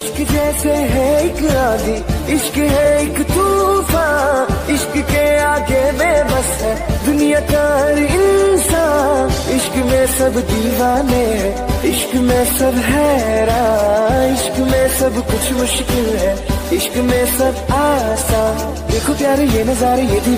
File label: bollywood music ringtone